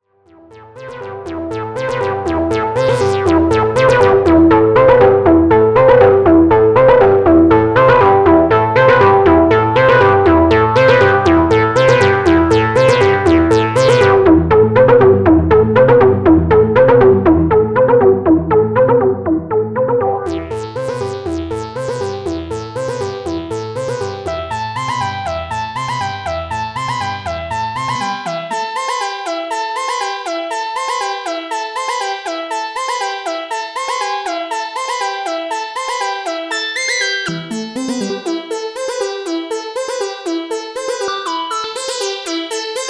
Novation Peak Arp

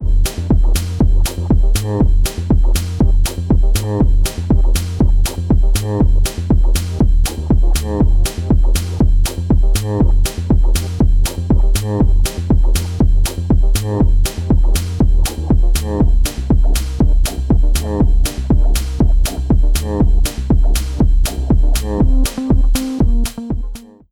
Dancey Beat

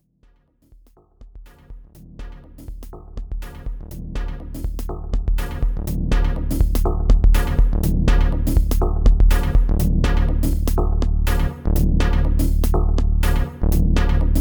MFB Tanzbär 2 glitchless

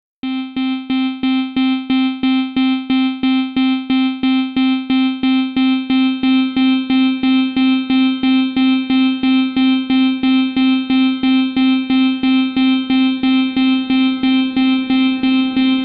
Reverb Controls

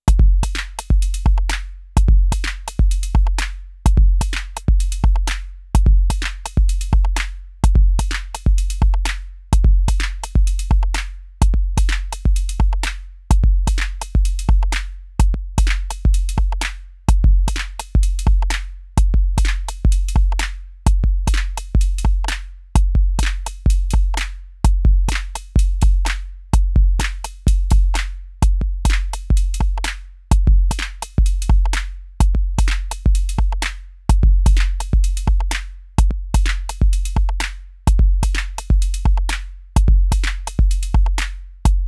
Shuffle

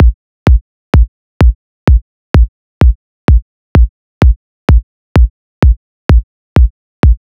techno 2pole; Techno Tutorial

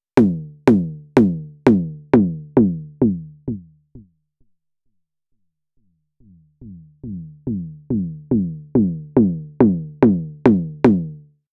Elektron Digitakt Lowpassfilter

